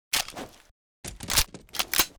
Scorpion reload; STALZONE

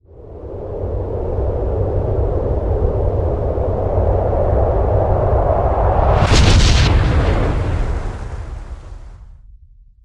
funnel active; STALZONE; somber anomaly

